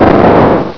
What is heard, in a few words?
explosion